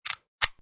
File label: Lock